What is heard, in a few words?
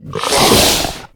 bloodsucker attack hit; STALZONE; customnpcs; stalker